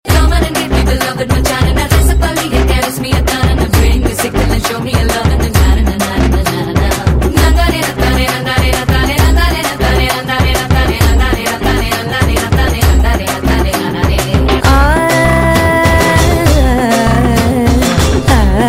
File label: tamil folk